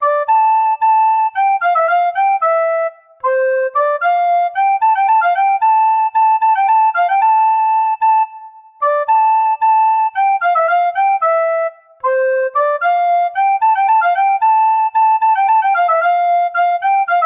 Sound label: Recorder English Flute; Woodwinds; DAL Flute; Syntheway